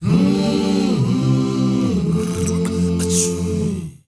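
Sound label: HUMMER, MMM CHORDS; Spectrasonics; Heart of Africa 2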